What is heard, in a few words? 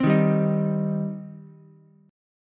C Minor C down Ukulele; strummed; JGuitar